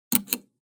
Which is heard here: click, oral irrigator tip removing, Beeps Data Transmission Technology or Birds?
click